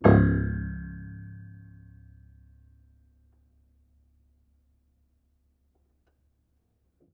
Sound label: Harm Low, Piano Harmonics